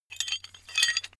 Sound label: metal handling pieces